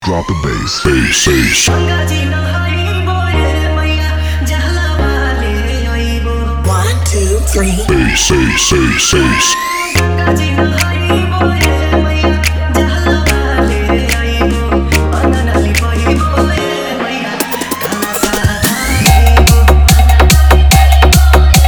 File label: Navratri Dj Remix Songs Edm Trance Mix